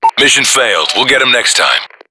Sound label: lose; payday